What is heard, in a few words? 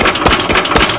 MOTOR OLD, MACHINES